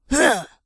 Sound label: attack, Zombies; zps; Vanessa